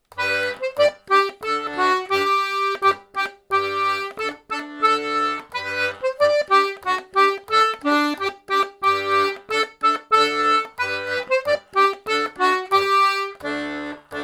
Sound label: Karaoke